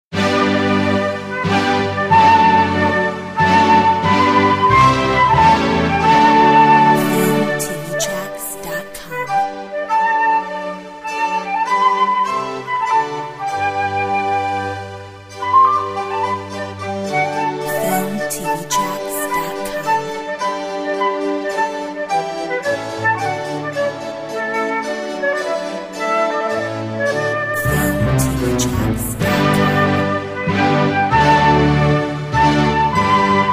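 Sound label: Asian Lounge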